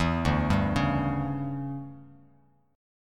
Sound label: D Major 7th Suspended 2nd Suspended 4th, Bass Standard; arpeggiated